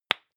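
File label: lipstick open hard, Bathroom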